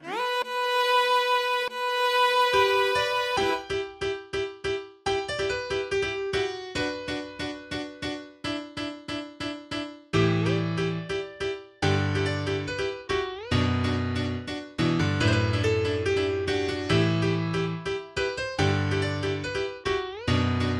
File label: Viola Piano Voice